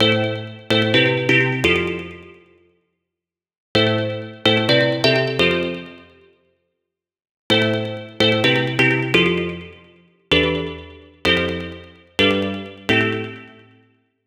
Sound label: pluck champagne launch; cosmic